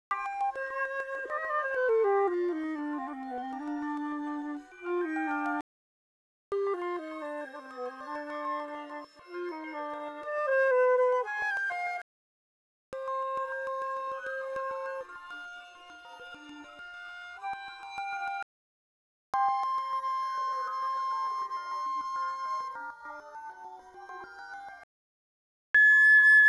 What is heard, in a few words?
Six Flute Melodies